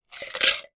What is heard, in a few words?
ice glass, glass clinks